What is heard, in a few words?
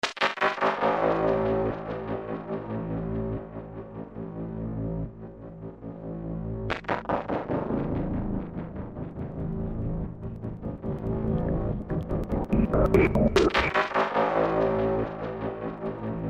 Tempest March; Disney WorldWide; Soundscape Cues